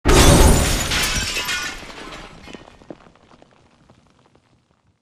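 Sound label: explosion close debris; corporate; Titanfall 2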